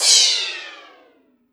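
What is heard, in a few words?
cymbal, Drum & Bass 160 BPM; Ueberschall; Drum & Bass Flash Trackz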